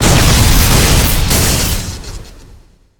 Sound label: boss defeat; spider-man-mysterio-rush-game